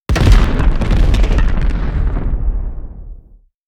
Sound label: skill snake disappear